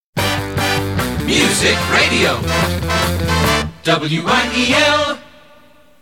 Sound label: jingle